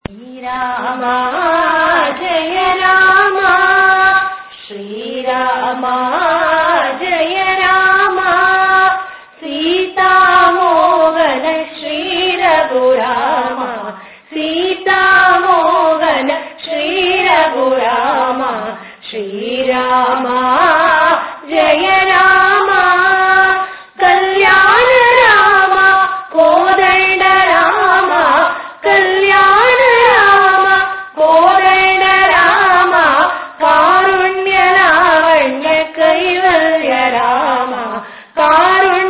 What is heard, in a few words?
Bhajan; Srirama Jayarama